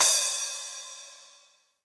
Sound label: Splash 12 Steely Tight, Cymbal; Drum Hits; Standard Hits; Ableton; Factory Packs; Session Drums Studio